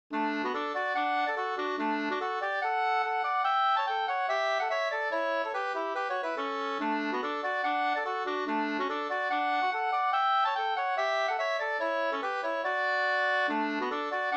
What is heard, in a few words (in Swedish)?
reinlender